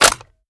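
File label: sniper reload fast mag in; gmod; iw8; alpha50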